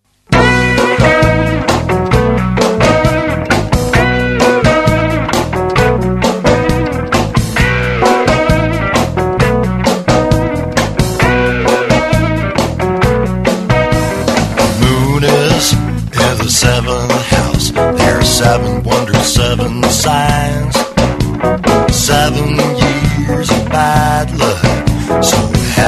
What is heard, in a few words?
blues